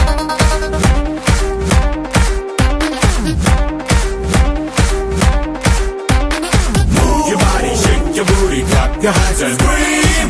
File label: Instrumental Tone